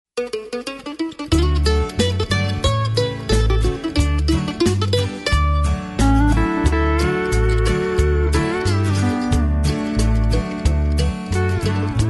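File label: background vocal, square dance music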